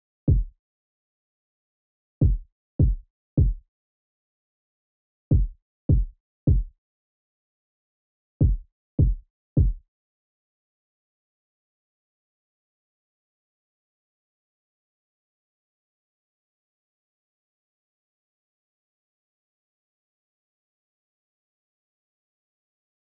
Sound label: KICK